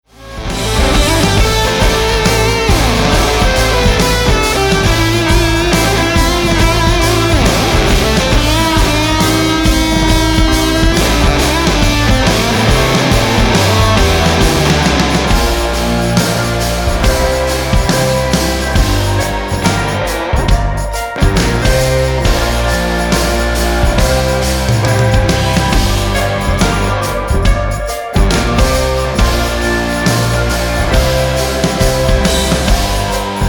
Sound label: INSTRUMENTAL